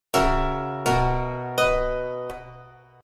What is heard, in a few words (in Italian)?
Quarta e settima ritardando